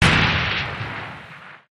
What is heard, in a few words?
troika fire stop, turrets; gow